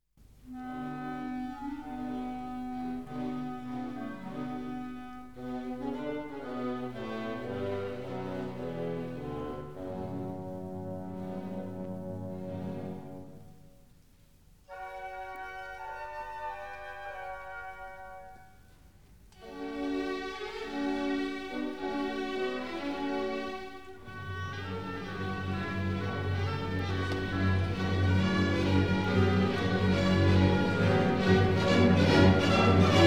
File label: Cello Concerto; Cello Concerto No. 3 (Dvorak); Legend of Galactic Heroes; Galactic Empire SIDE